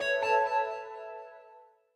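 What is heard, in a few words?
bright clear ringing short notification sound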